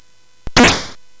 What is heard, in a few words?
Space Gun